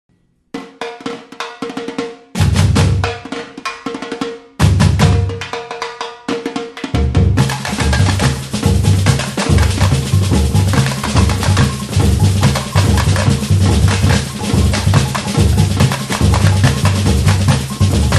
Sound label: brassband; Solarmusic